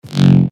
Extra Layer F#; Big Room

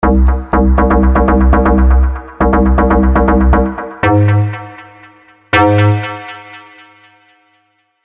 Techno Rumble; SERUM ULT BS

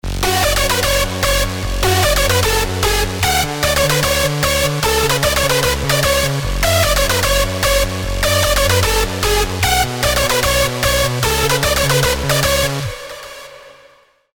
Hardstyle Melodies F# WET (150 BPM)

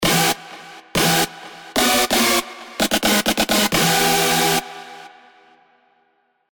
Stab; Stab I Mean It; Serum

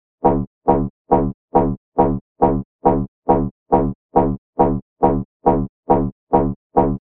Reverse Bass Loop D (138 BPM)

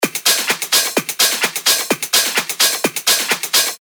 128 BPM

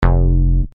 Evil Crunch

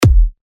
Punchy Kick D#; Big Room

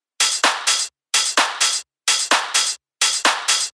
128 BPM

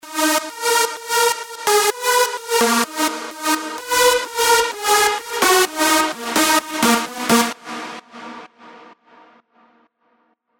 Big Room Leads D# WET (128 BPM)

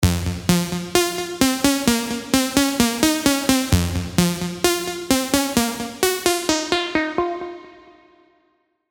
Shivering

